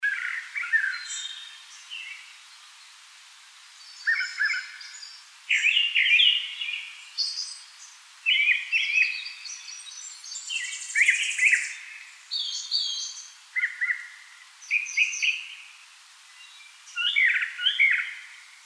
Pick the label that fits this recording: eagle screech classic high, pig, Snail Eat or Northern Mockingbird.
Northern Mockingbird